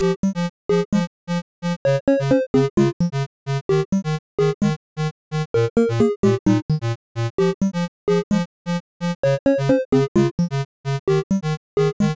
Sunday at the disco NES, It is in the form of a sequence of dance style; Sunday at the disco (NES Version); Liberated Pixel Cup